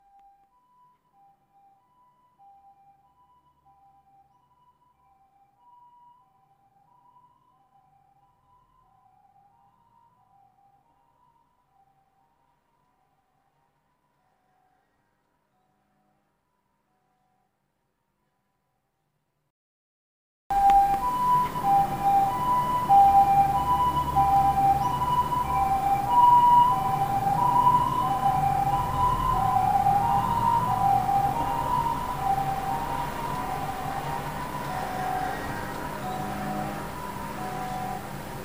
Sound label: siren